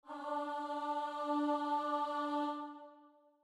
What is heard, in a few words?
starting note, voice; short and fast